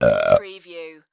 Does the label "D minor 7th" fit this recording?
No